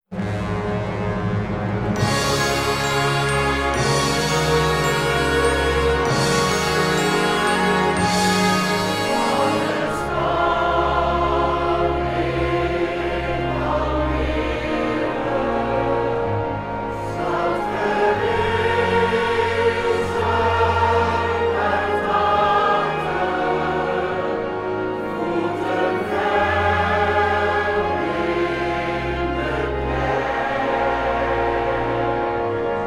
Orkest Koor, anthem song for the choir and orchestra; Almere